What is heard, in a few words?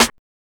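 house snare drum; E-Lab; X Static Goldmine 1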